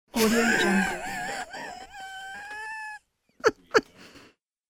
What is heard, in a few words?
female breathy laugh